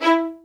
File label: MED ATT E4, soft violins; Q Up Arts; Denny Jaeger Master Violin Library